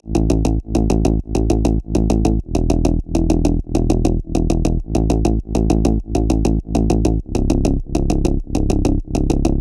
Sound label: synthwave bass 100bpm Dbm; synthwave anthems collection